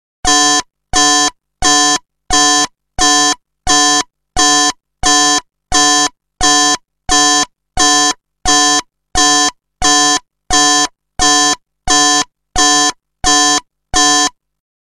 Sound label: Most Annoying Ringtone For Alarm